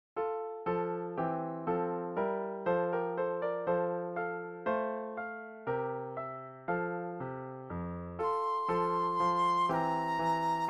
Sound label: Processional Flute Solo; classical; Daquin